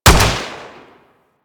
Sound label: Gorgon Fire; Warframe; Weapons